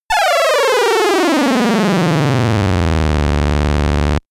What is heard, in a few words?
synthetic signal